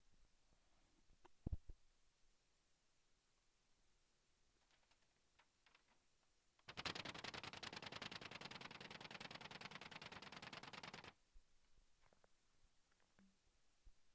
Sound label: Blown Out Tire